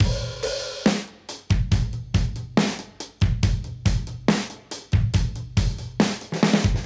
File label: Modern Breaks; Touch Loops; ClassicBreakSessions